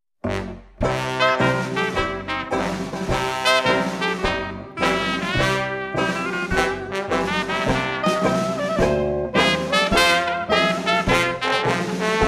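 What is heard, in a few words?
Oldtime Jazzband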